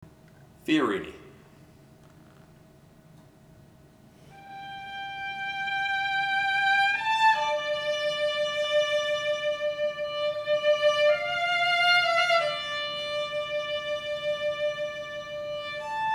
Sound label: violins; Fiorini